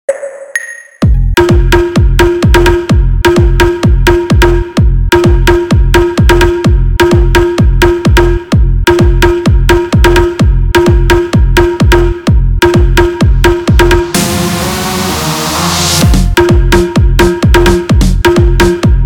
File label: EDM PLUCK; рингтон